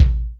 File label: BD basc, Yamaha DTXpress Acoustic Kick